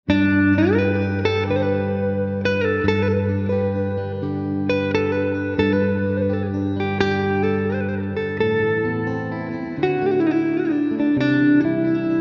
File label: Guitar Slow, instrumental ringtones